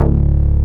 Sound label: MOOGY BLUES, BASSOUNDS; Masterbits; Dance Trax